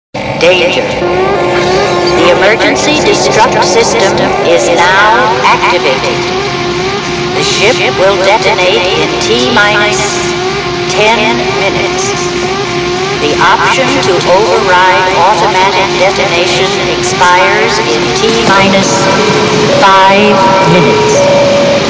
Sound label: Klaxon; Aliens; Movie